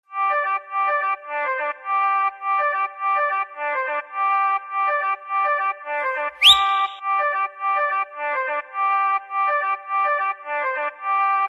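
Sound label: Solo background music Ringtone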